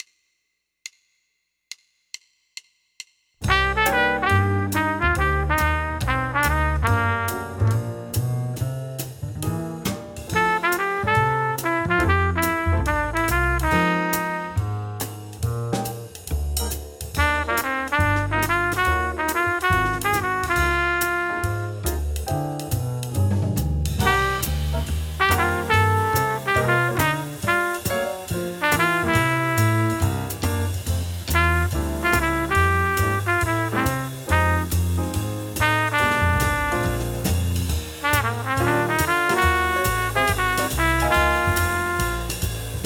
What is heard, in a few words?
Jazz Etude; Jazz Etude #2 Trumpet and Track